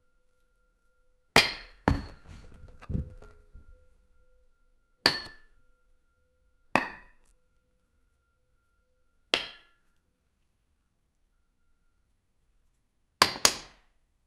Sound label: man knocking out blind ma, falling